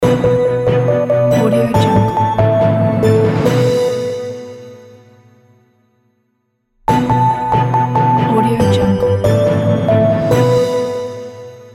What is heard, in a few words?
headlines of tonight logo; sixidebeats